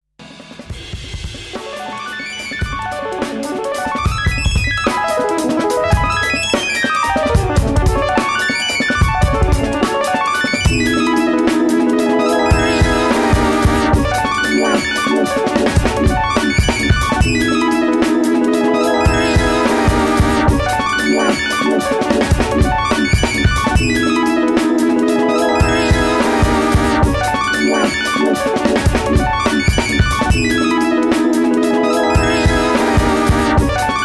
Drums

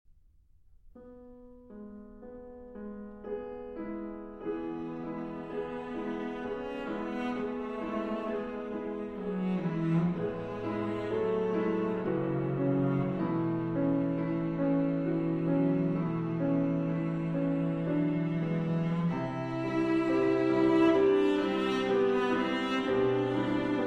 Andantino For Cello and Piano

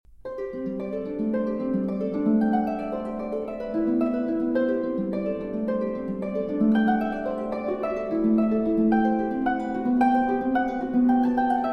Suite For Flute & Harp; Hovhaness; Op. 245 The Garden of Adonis

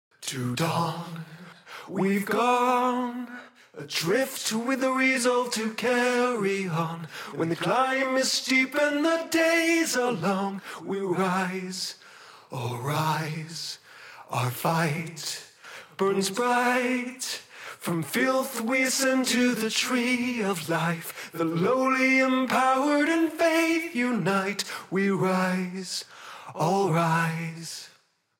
Vocal Stem

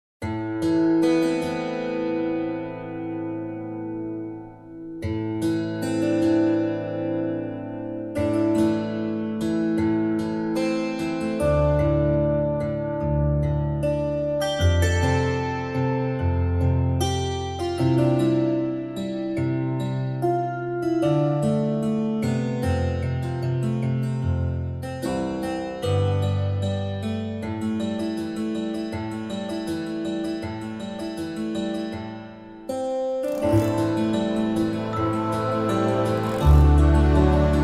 Instrumental

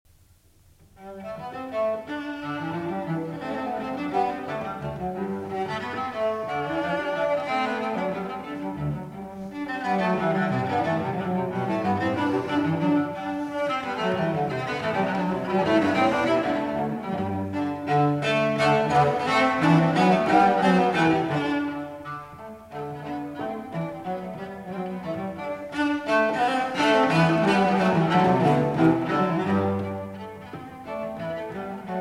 Chaconne; Couperin, F Les Goûts-Réunis ou Nouveaux Concerts, Treizième Concert IV. Chaconne Légère

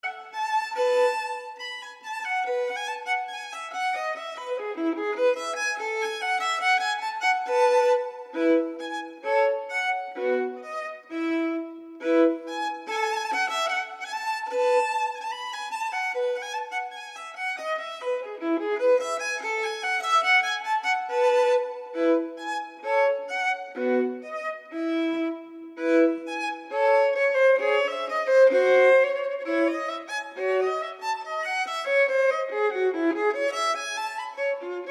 Violin Partita in E Major, Gavotte en rondeau; No. 3; BWV 1006

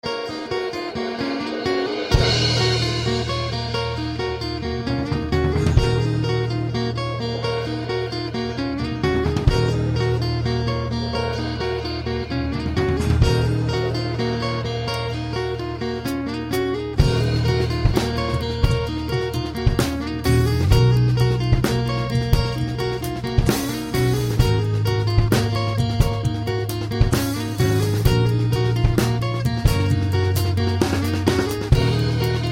Acoustic

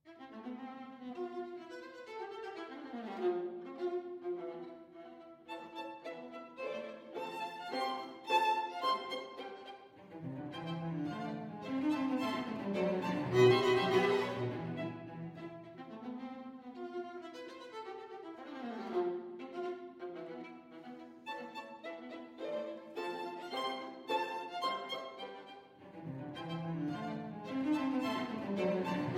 String Quartet in E Minor, Allegro Molto Leggiero

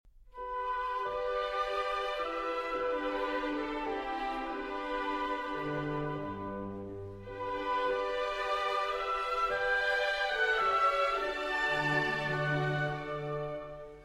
Summer Song, Arr. For Orchestra; Fantasy Pieces, Op. 45; No. 4

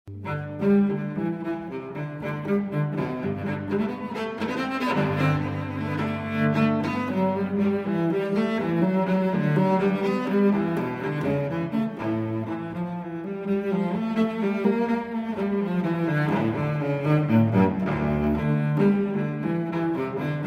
Unaccompanied Cello Suite G Major Gigue; BWV 1007

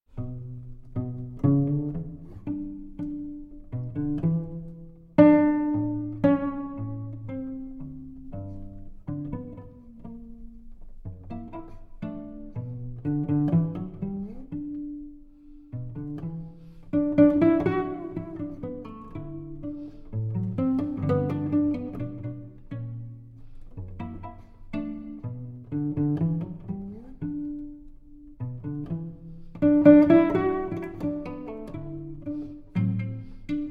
Arr. For Solo Cello

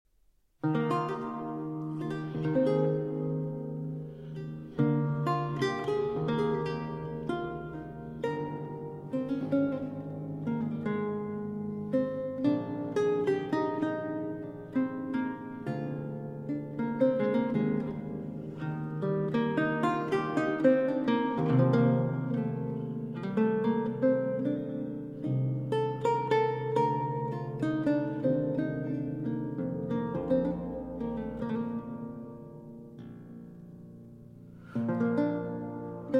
Cello Suite in G Major, Sarabande; Cello Suite No. 1; BWV 1007 IV.

